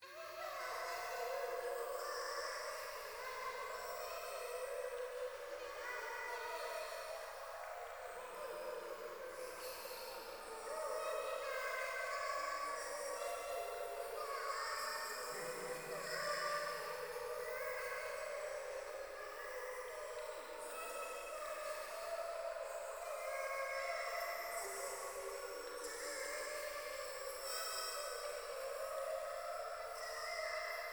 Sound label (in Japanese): ゴースト 可愛い喘ぎ 川のせせらぎ